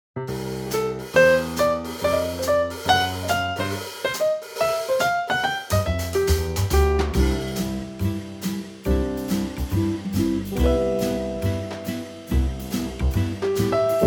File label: swing C; key - C - vocal range - B to E; Wonderful Quartet arrangement - piano, acoustic guitar, upright bass and brushes - in a lovely light arrang